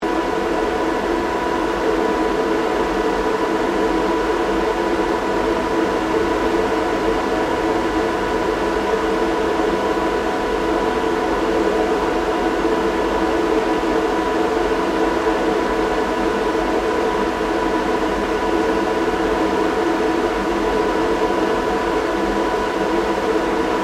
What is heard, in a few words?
PUMP VENTING SYSTEM OPERATING LOOP, water pumps; Machinery; Machines